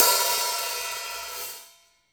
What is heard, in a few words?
HH OP, Drum Dry; East Collexion; Drum 1